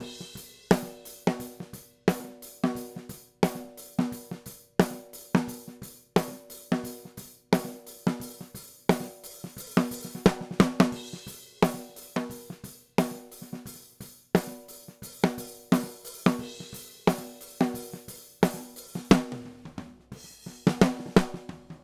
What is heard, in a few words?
Snare Chorus